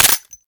Scaldra Pistol Mech React

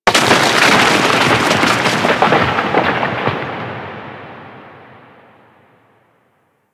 Rock Fall Large; Ambience; Warframe; Corpus Outpost

